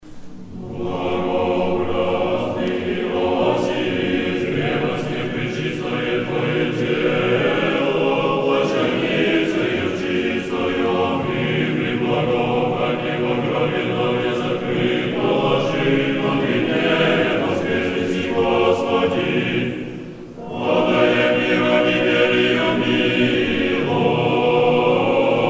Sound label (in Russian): hor nedelya zhen mironosicz tropar; moskovskogo sretenskogo monastyrya; blagoobraznyj iosif